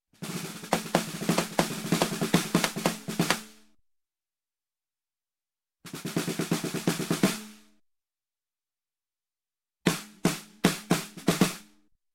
Snare Drum accent, Music, Percussion; Sound Ideas; Series 2000

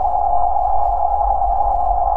Sci-fi UFO Loop; SFX Kit; Sci-Fi Loops

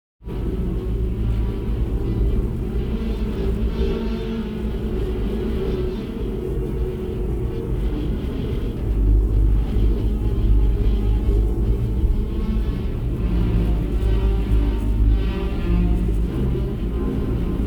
NEON AMBIENCE LOW Airy Metal; boom library

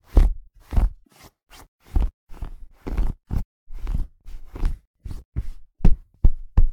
brushing and hitting pillow; human; NS Studios - ultra sound collection

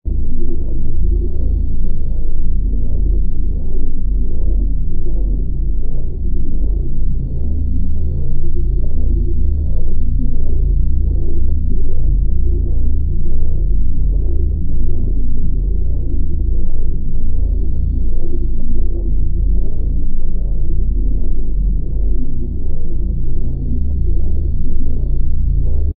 AMB Misty Lands

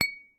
glass clang1; NS Studios - ultra sound collection

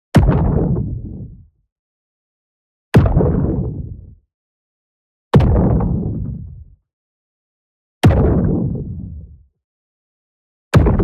EXPLOSION LARGE Hollow Burst; boom library; Matter

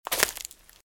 Footsteps - Leaves - Walk - Right; RadwinIsland

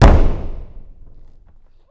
Boxing Ring Bodyfall

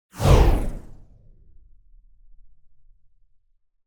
Whoosh Fast And Deep Passing; Hollywood Edge; sound designer's toolkit v2

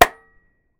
toy gun fire; weapons; NS Studios - ultra sound collection

